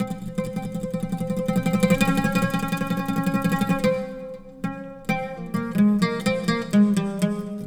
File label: SITAR ETHNO 125; eJay; Guitar